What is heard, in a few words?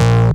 Synth Shots; Vengeance Samples